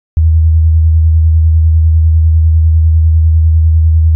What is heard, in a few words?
80Hz Plus 90Hz Unequal Amplitudes